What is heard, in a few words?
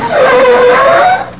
CAR STOP; psion cd vol1